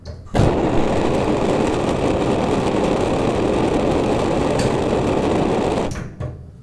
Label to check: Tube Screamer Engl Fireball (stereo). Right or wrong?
Wrong